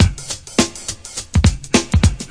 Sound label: classic brk; DRUM LOOPS and BREAKS; VIBRIS CLASSIC BREAKS